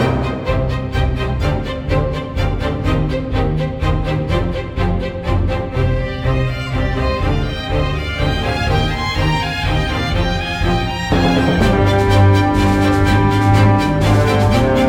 Suppression Menu Loop

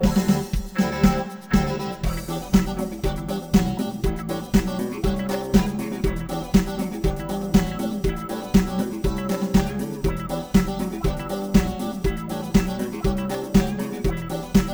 Disco; Cyberdillo; 3DO

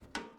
metal jump

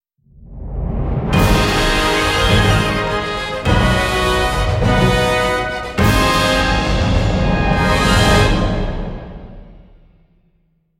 Battle Win; willy nilly knight macos windows gamerip 2017

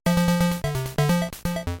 Health Lost

